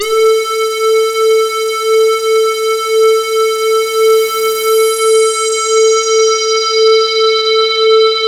LUCKY SWP, SYNTH; Keith Emerson Signature Series

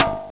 Ethnic Perc; M8; Genesis

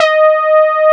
SB HORN; Wizoo - Powered Nord; STEREO LAYER

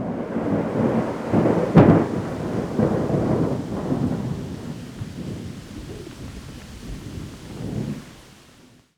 STORMY; Synth FX Misc

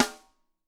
SD, Drums; Bob Clearmountain Drums I

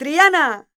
voc, Flamenco Female Cantaora; Vocals; ProSamples vol.46

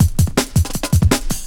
Drop 162, breaks

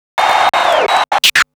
Scratch Fills 140BPM

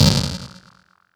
kit, Yamaha Motif-ES8

